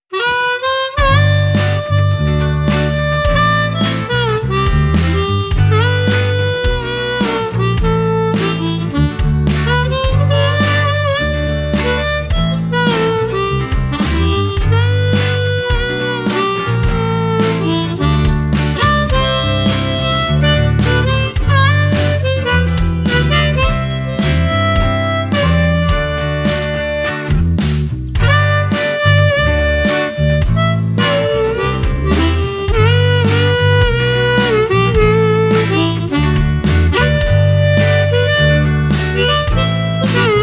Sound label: The Harmonica only Harmonica